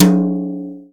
acoustic tom sound d key, Royality free tome drum sample tuned to the D note.